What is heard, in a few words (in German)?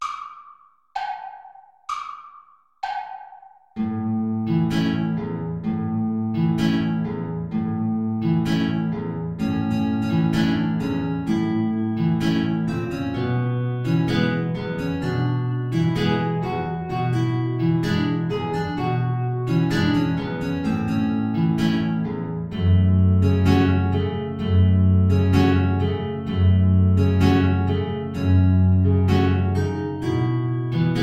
Tango (Querflöte & 2 Gitarren) (Play-along)